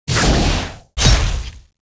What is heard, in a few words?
Metal elf Shield guard Attack